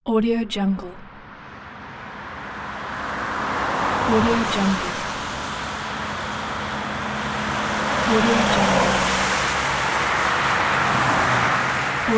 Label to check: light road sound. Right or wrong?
Right